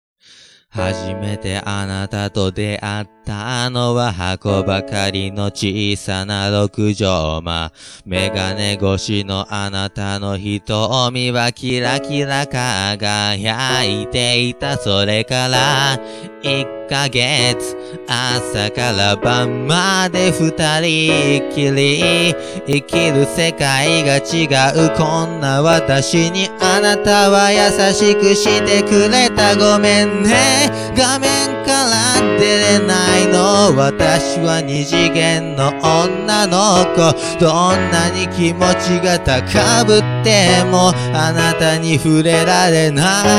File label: Rainbow Girl for Piano